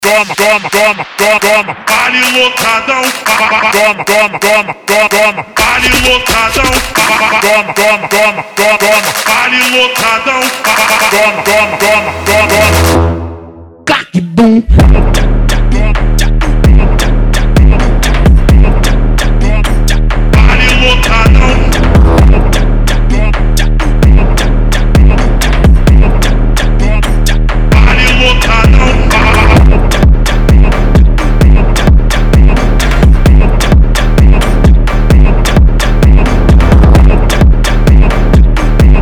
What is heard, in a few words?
Dubstep Remix; Geometry Dash